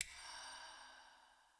Map Hover A

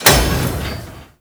Forest Piston; Props; Warframe; Grineer Forest

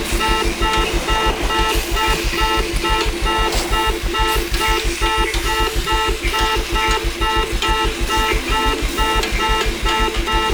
Forest Jet Pack Loop; Veilbreaker; Grineer Forest Thumper; Kahl; Helion

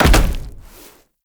Land Heavy Rock, Footsteps; Warframe; Tenno

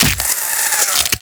Inf Uzi Embed Burrow; Infested; Weapons

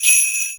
Sleigh Bells Single; Warframe

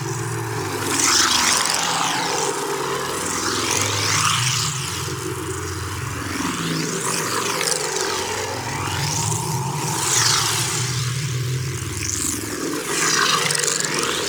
Portal Loop; Warframe; Orokin Moon; Ambience

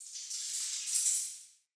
Cave Ocean Creature Close

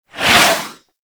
Missile Barrage Whizzby; Enemies; Abilities; Lasrian Tank; Warframe

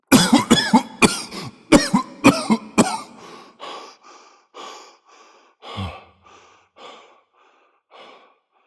Cough; Warframe; Entrati Lab Quest; 2023 TennoCon; Arthur

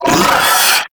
Swarm Vocal, Enemies; Warframe; ManInTheWall; LimbVocals

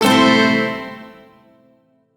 Shawzin Pent Maj Chord K; Warframe; Duviri

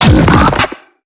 Hand Bomber Attack; Warframe; MiTW; Battle Barks

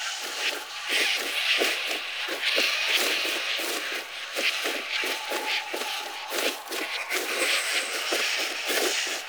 Undead Owl Loop; Dante; Warframe

